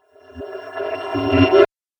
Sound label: Space Impact Reverse Strike; Sci Fi Sound Effects